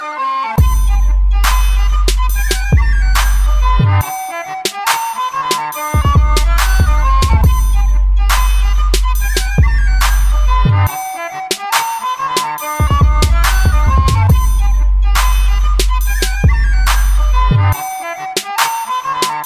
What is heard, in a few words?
Trap; Spongebob